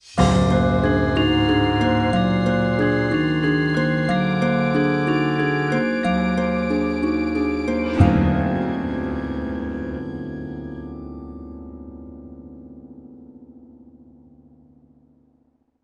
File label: Sitar; simpsons hit run; ps2